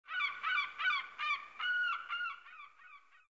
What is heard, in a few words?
Seagull